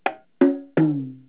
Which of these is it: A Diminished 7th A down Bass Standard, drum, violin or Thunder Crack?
drum